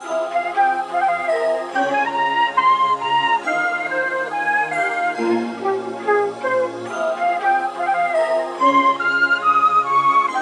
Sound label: F minor 140 BPM, melody